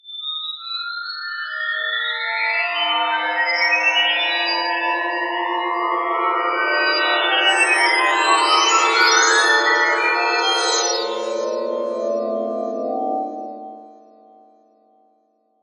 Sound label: SPEC BELL CHIME; eShop